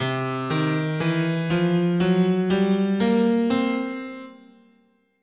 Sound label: C Mixo Blues, scale